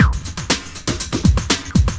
housefunk; Sonic Foundry (Sony Creative Software); Ugly Remnants VOL-2

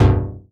stab thick, stabs

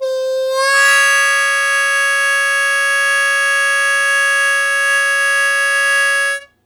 Blues Chord Attack F#1, Harmonica; Zero G

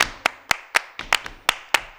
120 CLAPS

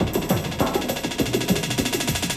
LOOP, Drum & Bass, 100 BPM

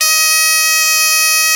BOMB2 E3, BOMBARDE2, Celtic Flavours; USB Soundscan vol.42; AKAI

